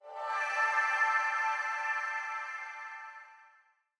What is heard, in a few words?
graphics application notification; soundscrate